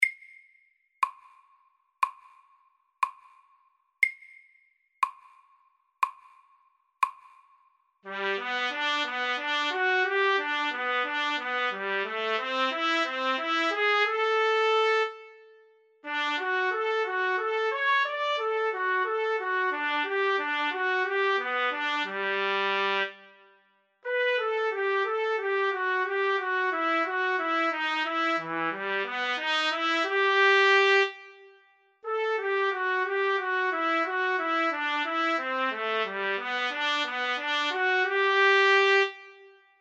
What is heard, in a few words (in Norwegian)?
Etyde i A dur SAKTE